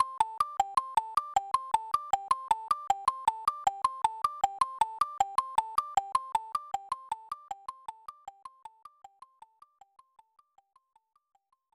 Unused Jingle; BS Buster's Digital Magazine; SNES

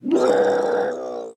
Cry; Peace, Death

